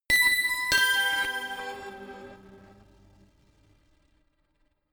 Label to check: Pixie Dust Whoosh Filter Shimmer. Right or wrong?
Wrong